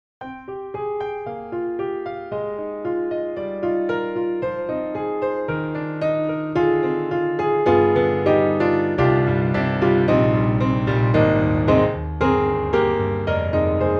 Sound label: Grand Pianos Studio 7ft Contemplative C7; Synthogy; IvoryII